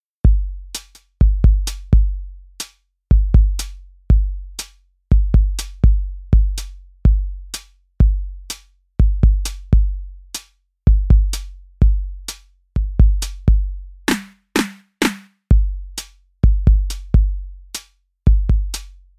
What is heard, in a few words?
Heartbeat; Softube